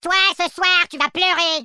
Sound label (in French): pleurer